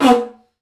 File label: Didgeridoo Kit Samples